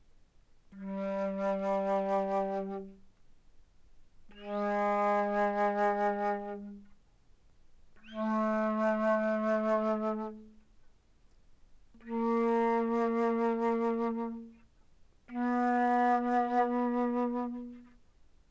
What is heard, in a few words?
Alto Flute mf G3 B3, woodwinds